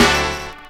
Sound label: HORNHIT, HORN STAB; E-Lab; X Static Goldmine 2